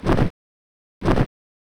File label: Walk; Salare; Seer; GamigoNA